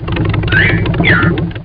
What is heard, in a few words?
Xylo Up-Down